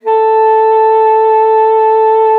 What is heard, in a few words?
A4, ALTOSAX; Xsample VOL-2; 90 s Sample CDs